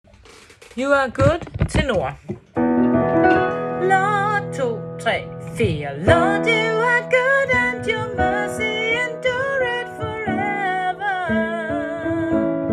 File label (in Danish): you are good tenor 3. stemme